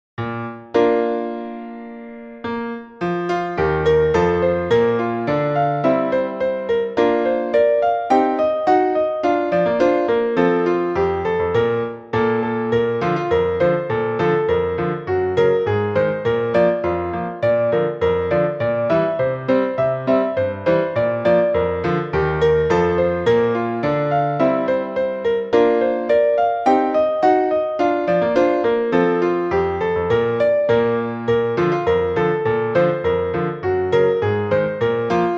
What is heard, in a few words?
seed piano